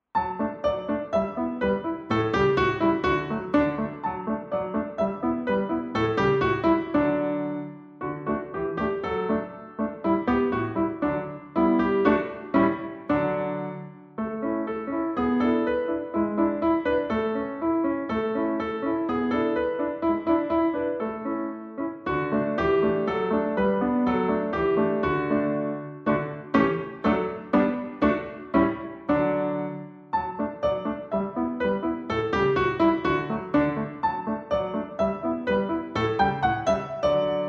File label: instrumental